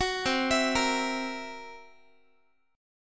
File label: C 7th Flat 5th C down Guitar; C7b5 arpeggiated; 21,20,19,20,x,x